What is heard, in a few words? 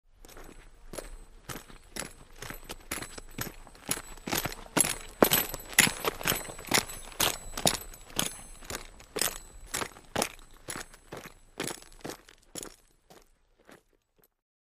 Spurs Walk By Fast On D